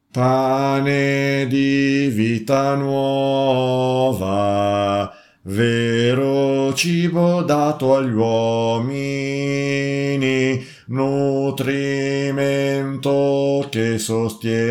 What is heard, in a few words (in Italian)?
contralti